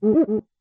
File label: chain; tenaPuyo